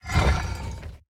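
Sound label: movement armor heavy; smite; god atlas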